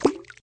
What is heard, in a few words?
splash